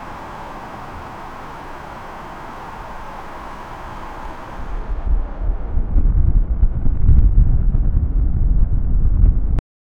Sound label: flying car wind sound